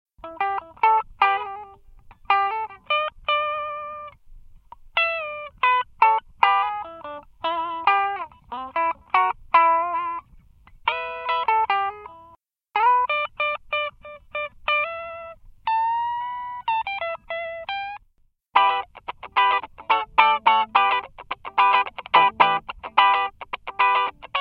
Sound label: tele Hot Rod Clean Bridge